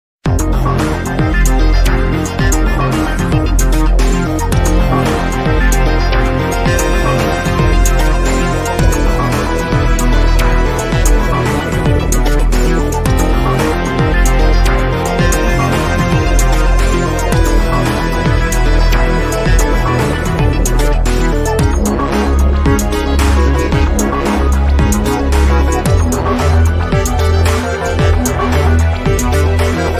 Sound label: Airship; SMM; SMB3